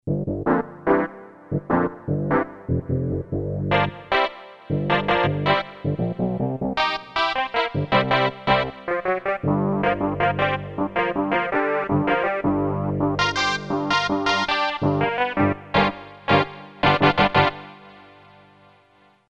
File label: Clavi 2, Casio CSM-1; Tones